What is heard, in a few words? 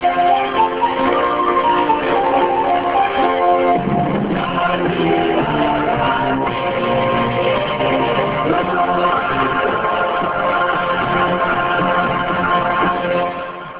Jingle; Radio Rossii